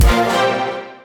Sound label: stop bonus; Fire Queen